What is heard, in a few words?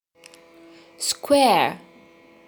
square